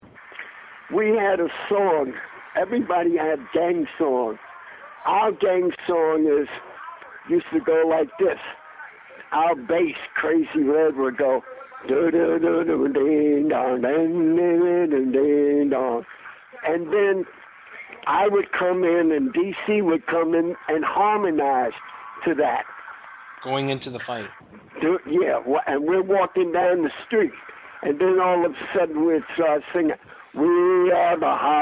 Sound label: Rumble Song